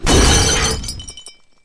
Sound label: pinpoint attack; classicro